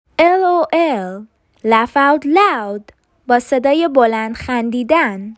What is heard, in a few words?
lough out loud